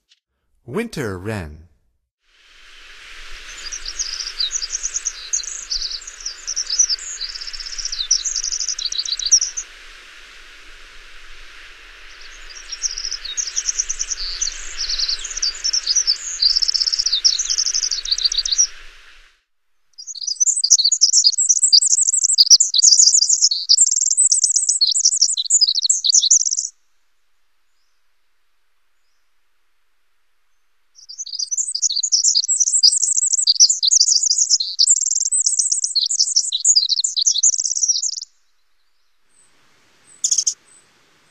Winter Wren, Songs of Birds of North America; Stokes

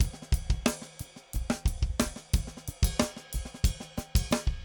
funk 7 8 90; odd loops

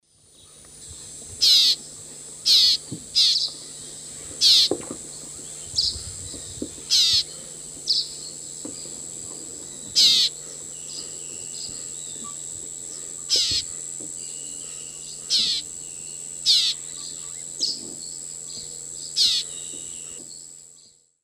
Agelasticus xanthophthalmus, call; S. America; Eastern Lowlands

